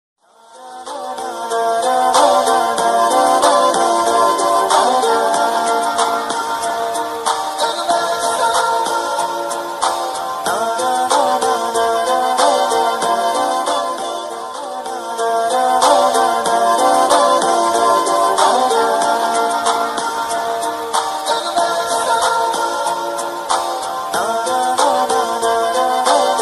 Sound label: romantic bgm ringtone; asali fighter movie; sundeep kishan; nitya menon